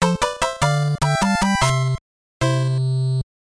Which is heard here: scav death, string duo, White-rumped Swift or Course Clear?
Course Clear